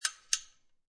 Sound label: Light Switch Rotary On Off, Household, Light Switch, Rotary Light Switch, Two Quick Clicks, On And Off